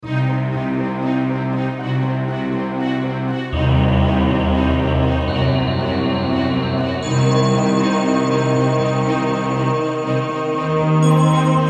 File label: horror film soundtrack; Mini songwriting challenge